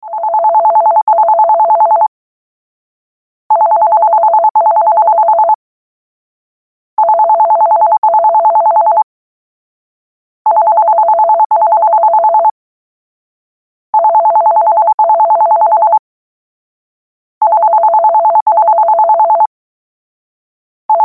landline phone ring ringtone

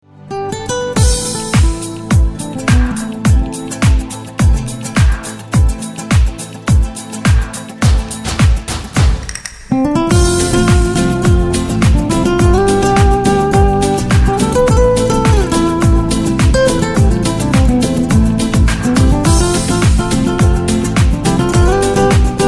lovely guitar ringtone; android

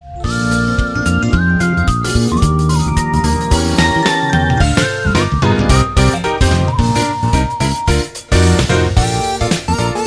happy birthday ringtone

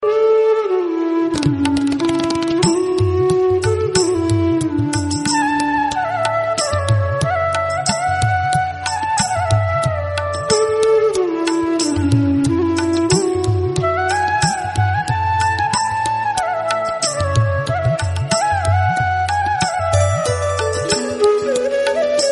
indian classical ringtone

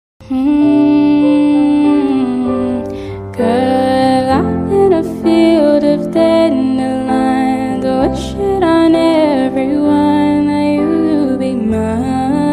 acoustic ringtone; android

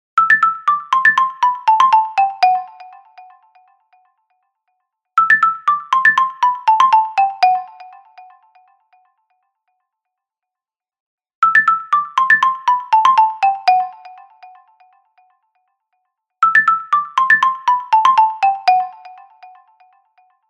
dance ringtone

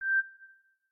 beep once ringtone; samsung

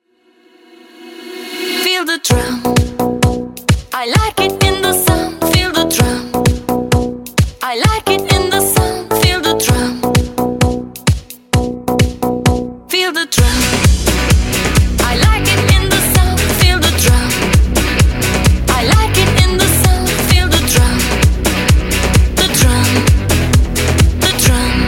drum ringtone; android

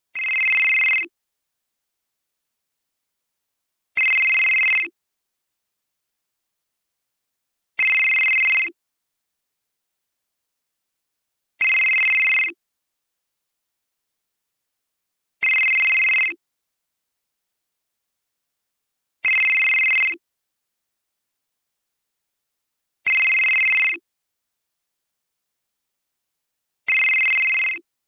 nextel ring ringtone

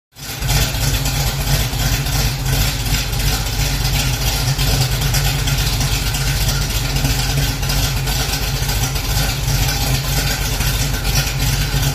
car ringtone; android

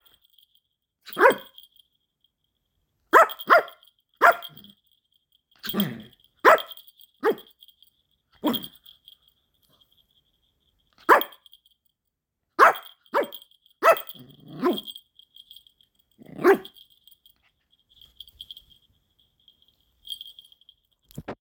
barking ringtone; android

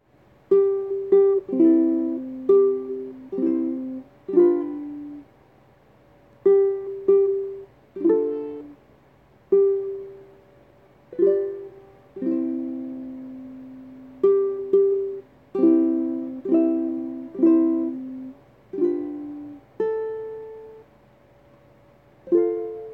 happy bday ringtone

